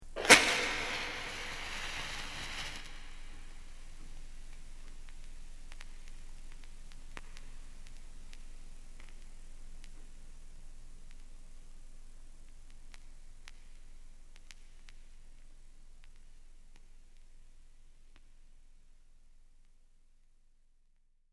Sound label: Dungeon Light The Fire Torch; Dungeon Rpg - Light The Fire Torch; Scary Soundscape Sound Effects; fantasy; Andrew Fleming